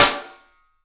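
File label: PICOLO SNRS, snare, drums; 3D Sound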